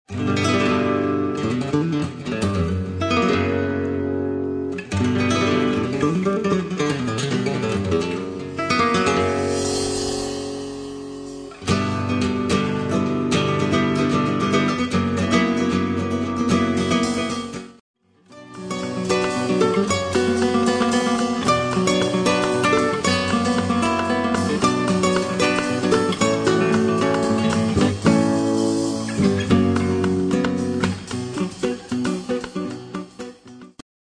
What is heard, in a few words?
guitarita